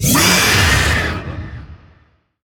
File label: Vocal Attack Short; Warframe; Zariman; Void Angel